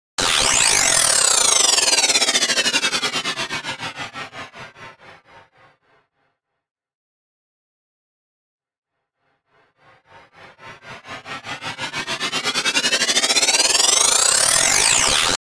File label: Chuffing Sweep